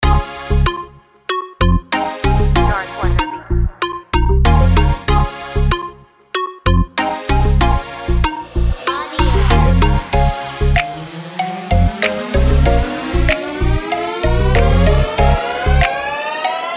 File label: Instrumental